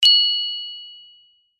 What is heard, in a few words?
multimedia notification bell chime ping long decay